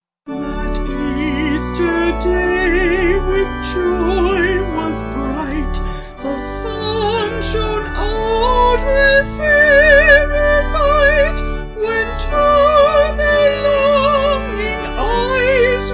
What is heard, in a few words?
Vocal Organ